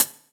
present closed high hat sample B key, hi hat tuned to the B note